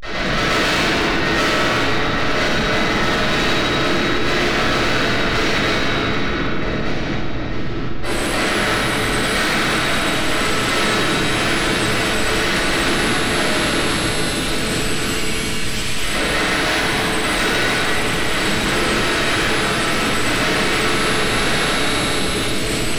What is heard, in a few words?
abstrakt tool noise; technologybrain